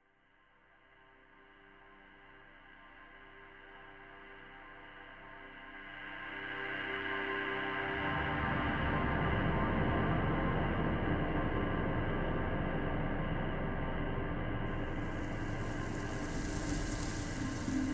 Cryo Ambient

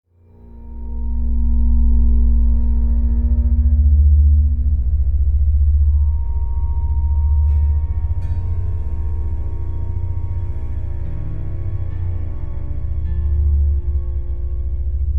Eerie

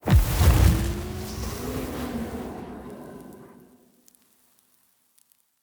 Demon Kill

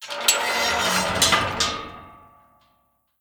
Gate Open

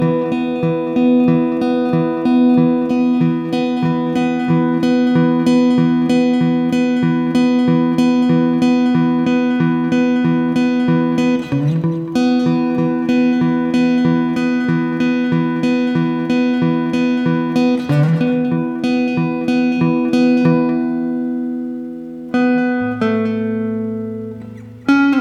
Camp Approach Guitar; Firewatch

